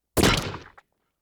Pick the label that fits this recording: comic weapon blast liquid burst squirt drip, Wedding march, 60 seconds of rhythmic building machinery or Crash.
comic weapon blast liquid burst squirt drip